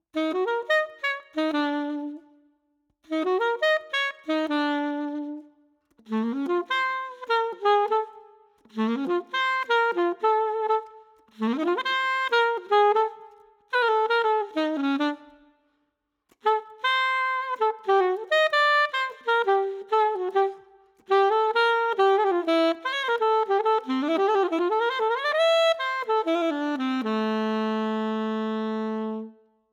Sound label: GRAFTON PLASTIC; timbri